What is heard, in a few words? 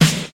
studio acoustic snare d sharp key, Royality free snare one shot tuned to the D# note